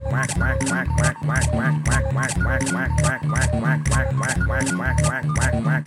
quack song

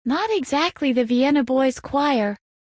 boys choir

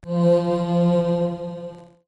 skill gain

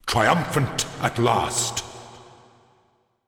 triumphant

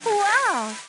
woah

